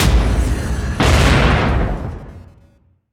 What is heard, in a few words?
symbol dynamite; Wild Guns